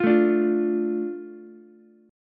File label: D Minor D Ukulele; strummed; JGuitar